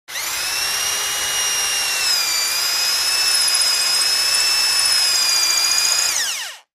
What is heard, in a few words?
Electric drill bores holes in wood at variable speeds.; Tools, Hand Drill Motor, Drill